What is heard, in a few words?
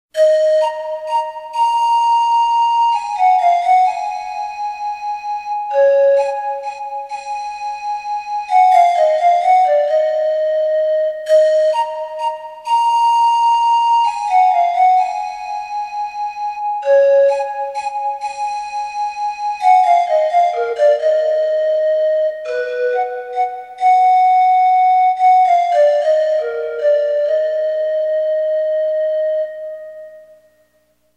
Pan Flute